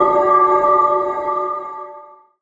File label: ambient game ui notification ambient ringer; esmteam